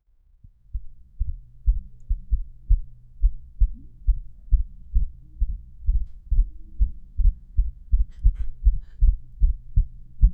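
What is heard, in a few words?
heartbeating of 5 persons; sound effect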